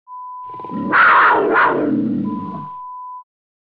Big Cat sound

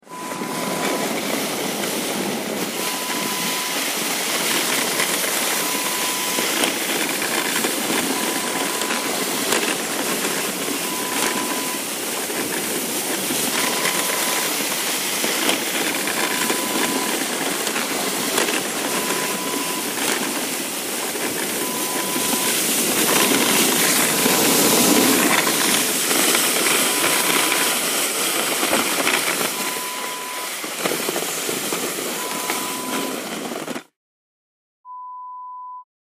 Sledging

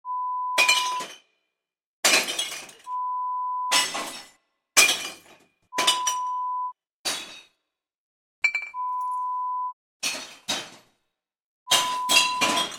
Smashing Glass Bottle sounds

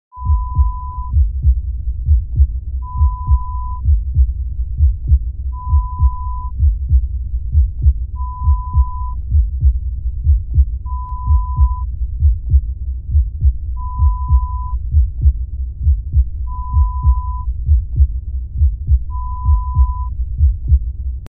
Heartbeat sound

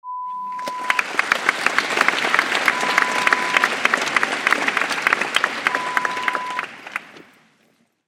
Applause Large Hall

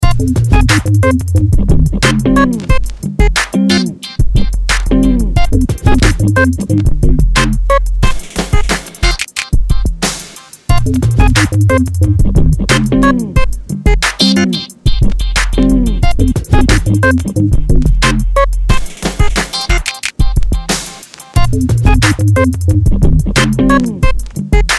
hip hop

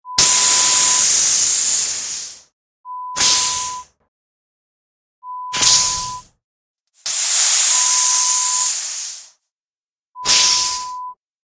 Compressed Air sound

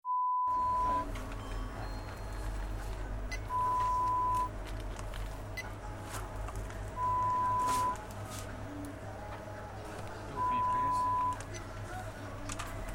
Corner Shop sounds

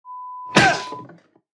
Punch

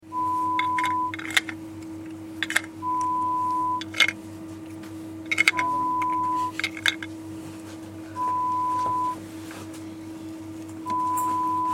Lab Ambience sounds

